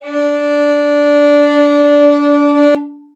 Violin D; Instruments; 7rystan's 700+ FREE COLOSSAL EDM SAMPLE PACK

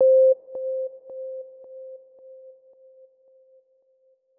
key; bespoke - Floral v.1

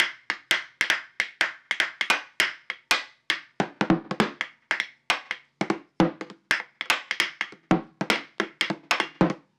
swung percussion loop 100 bpm; percussion loops; bespoke - Floral v.1

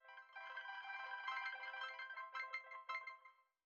granular piano Cmaj; bespoke - Floral v.2

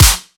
snare; 7rystan's Snare Pack 2018

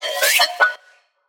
Synth

